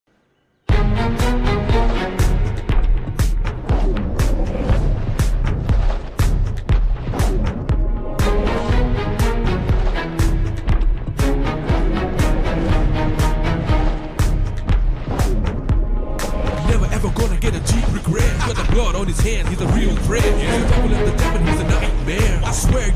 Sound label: villain bgm telugu